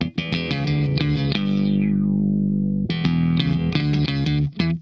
SHOT, Funk Guitar; 90 s Sample CDs; Zero G

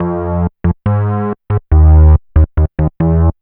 Bass, Loops; Trip-Hop

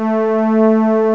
STRNGENS, LOSTRING; M8; CMI Factory

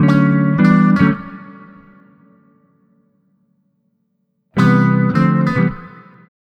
Multi Guitar Bossa Gtr-D, 105; Zero-G Kocktail Kollection; Construction Kitz (Lounge)

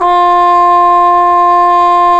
Cup Mute Dry, Cup Mute Tpt; Roland L-CD702

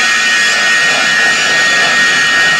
STORM; Northstar; The Wizard VOL-1; TERROSTROIKA

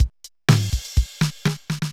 Loop, mxr; classic machines

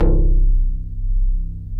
OBDX GTR B; Synclavier Sampler Library; KEYS AND GUITARS

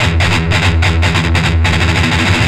Riff, Industrial Nasty

